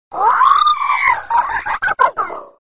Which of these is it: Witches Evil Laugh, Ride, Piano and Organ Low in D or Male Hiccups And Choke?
Witches Evil Laugh